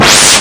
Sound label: laser gun; operation